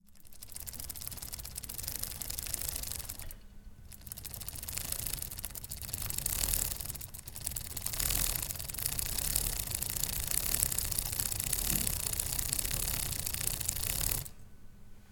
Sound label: Backpedaling deraileur (fast), Bowed Bicycle; Submerged Tapes